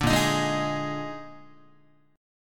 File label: Bb-11th-Bb-down-Guitar; Bb11 strummed; JGuitar